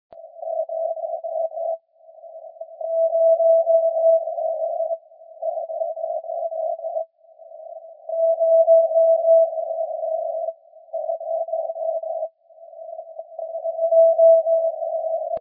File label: echo; Echo test at 500hz bw + spectran; 432Mhz EME antennas at FR5DN; VK3UM